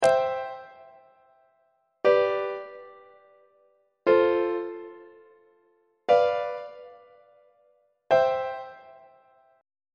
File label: b sharp major leading tone iac; on treble clef